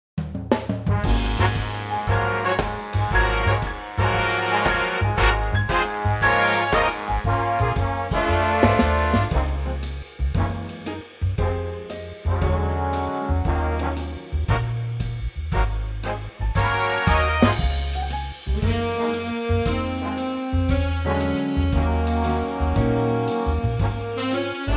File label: Db Big Band; key - Db; range - Ab to Db; Cracking Big Band arrangement